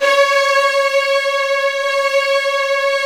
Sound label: HDS C#2, Virtuoso Strings; ILIO; Kirk Hunter Virtuoso Strings VOL-1